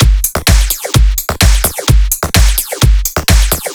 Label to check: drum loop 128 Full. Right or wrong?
Right